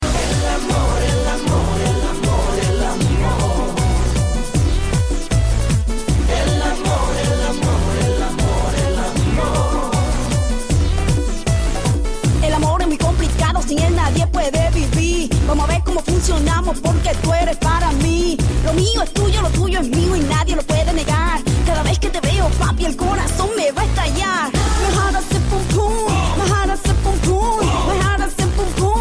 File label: merengue; El Amor; Latin